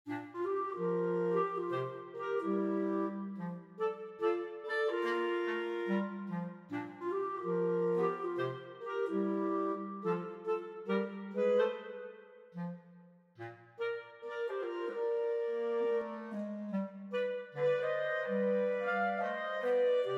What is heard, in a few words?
arrangement clar